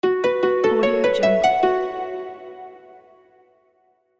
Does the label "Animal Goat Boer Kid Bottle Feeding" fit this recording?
No